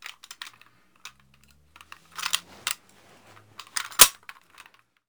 Gun Handling Weapon, Weapons Foley; SFX Kit

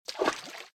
Footsteps - Water - Ankle Level; RadwinIsland

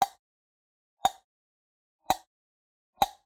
Generic Click Glass Small; boom library; The Interface

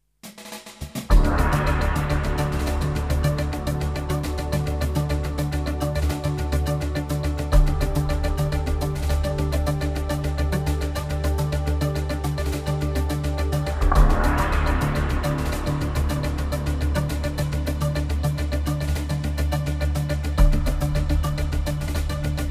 Jingles; 90 DDD Jingles

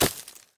weed land; firefight

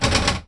Pinball Bumper

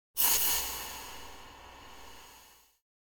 Impact Thin Pointed (double) Impact; Hollywood Edge; sound designer's toolkit v2

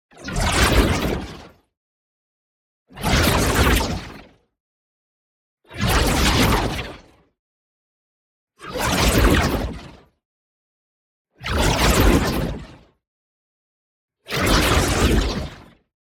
WHOOSH MEDIUM Fuel Blaster; boom library; Matter

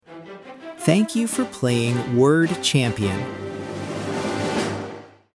Shortcut Jingles; Bruton Incorporated

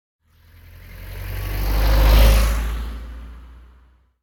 tractor; Blind Drive

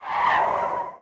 skid short; Blind Drive

